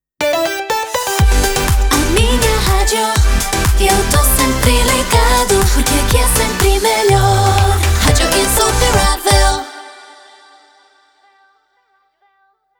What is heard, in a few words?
Radio Jingle, 122bpm, G, Slogan; Radio Insuperavel